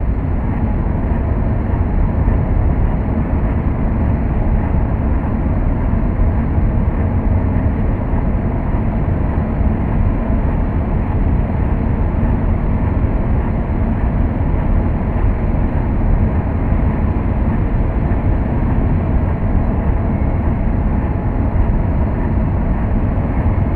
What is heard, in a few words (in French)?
ambiance; Feed The Beast